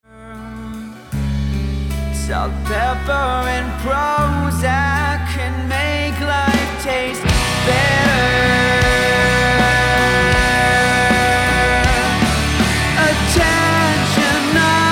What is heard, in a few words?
hard rock